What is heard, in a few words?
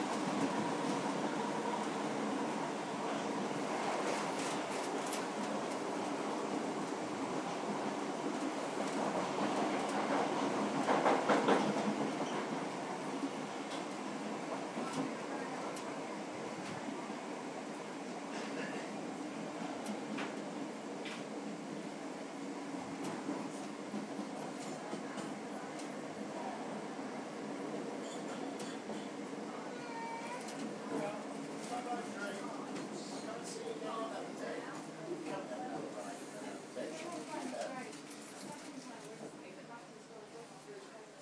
on board suburban train leaving whitton